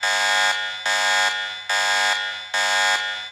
Warning Alarm

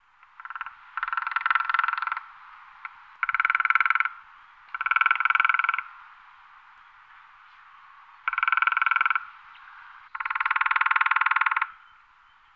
Spotted Woodpecker

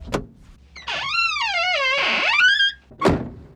Vehicle Door Old Open Close; Vehicles; Doors & Keys

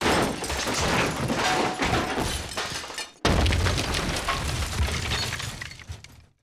Rocks Rubble Sweetener; Weapons & Explosions

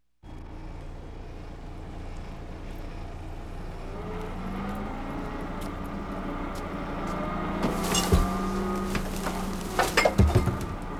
Garbarge Truck

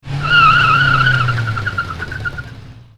Speed Bike Screech Brake; Vehicles

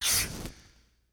Flamethrower Burst; Weapons & Explosions

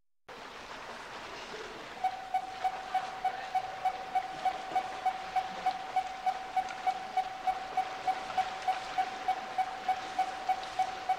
Submarine Ambience

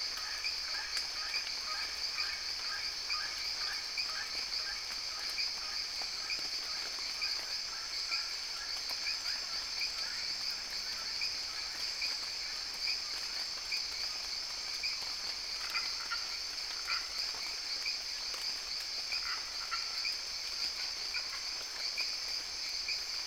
Jungle Frogs With Rain

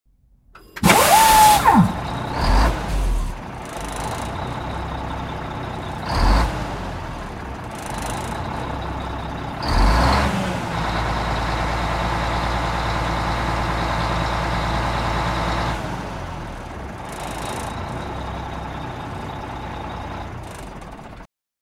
Big Rig

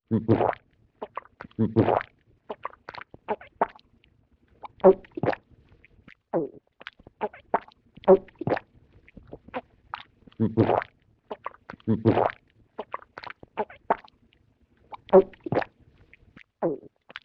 Human Swallowing; Vintage Cartoon; Comedy Vocals

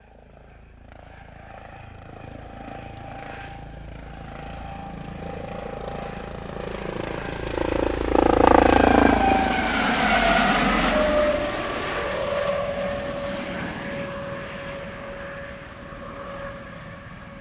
Sea Helicopter Medium By; Vehicles; Aircraft

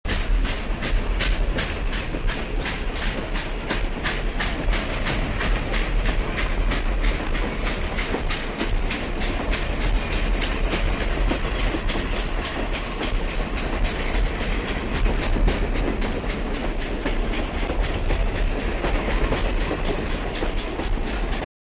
Steam Engine

